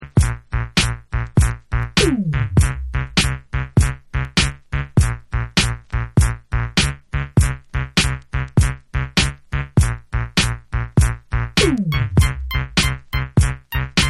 Instrumental

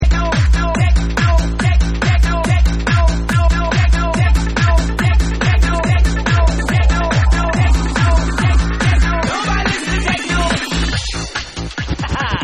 TECHNO

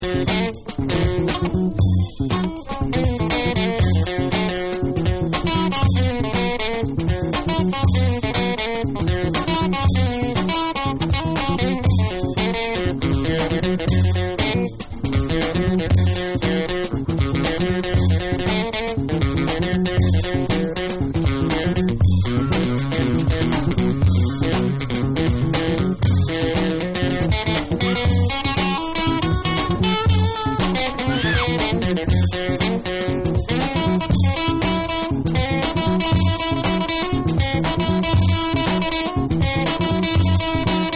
HONKY TONK POPCORN

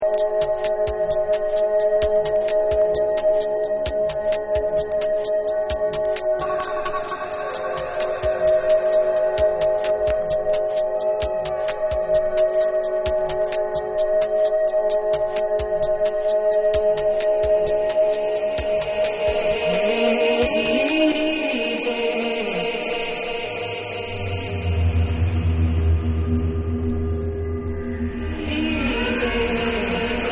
Cinematic Soundscape Mix

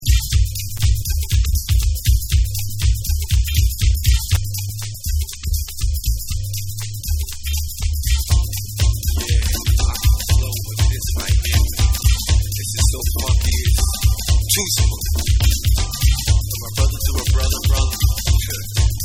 CELEBRATE Vocals

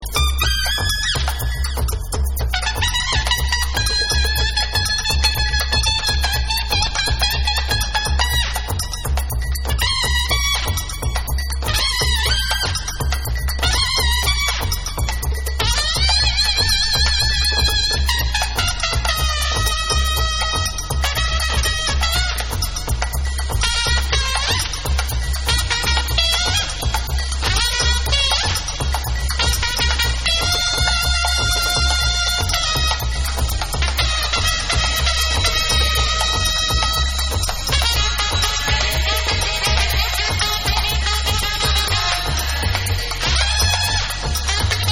Robust Horns Mix

